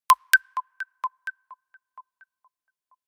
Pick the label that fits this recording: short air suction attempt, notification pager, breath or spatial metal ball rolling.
notification pager